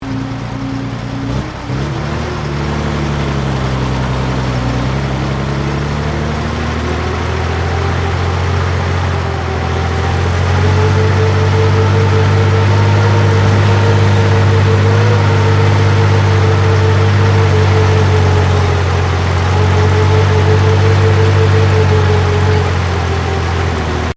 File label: TANK CENTURION START, British Centurian main battle tank; Trucks; Vehicles